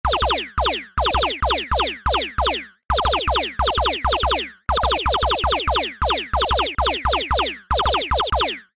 leisure video game retro laser gun fire multiple game action